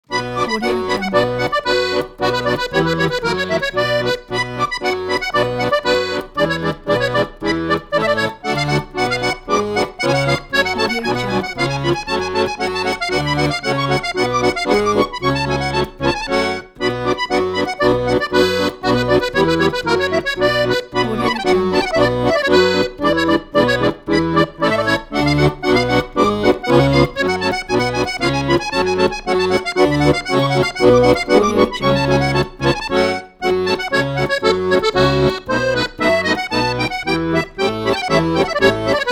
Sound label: bach badinerie from suite no 2 accordion solo